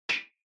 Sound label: Retro Anime Switch Low